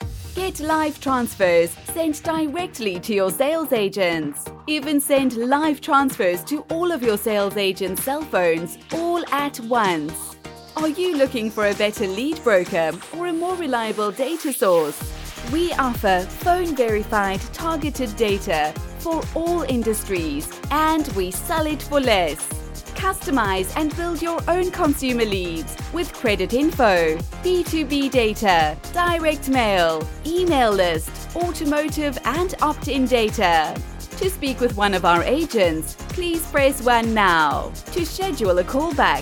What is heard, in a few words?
Backing